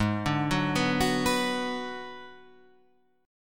G sharp Minor 7th Flat 5th G sharp down Guitar Half Step Down